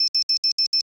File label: Beep Targeting Loop